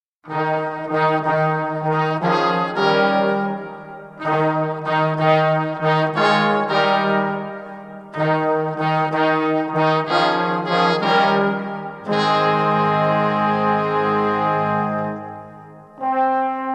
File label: Swing low, sweet chariot, Brass